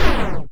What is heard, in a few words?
Explosion; cirnogodot